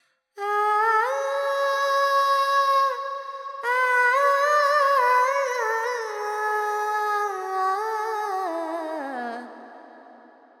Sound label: FX Phrygian, Carnatic Female Alaps; Vocals; 70 G; Sample Banks